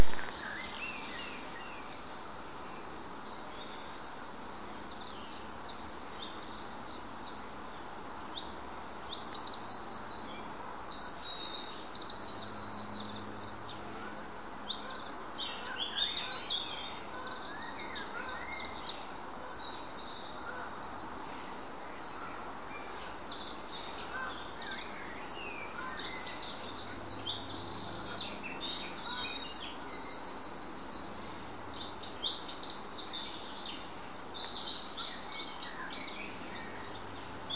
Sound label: birds and geese